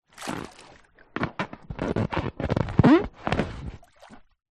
leisure inflated beach ball in water handling rubber squeaks